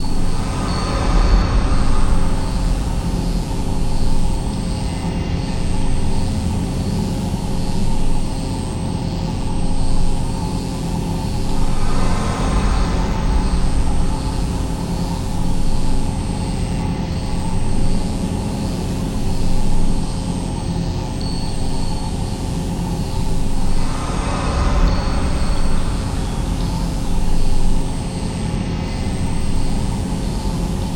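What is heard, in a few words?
amb doom drones static; SoundMorph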